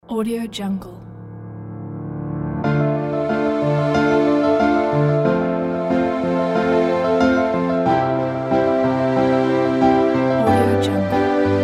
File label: cinematic wonderful world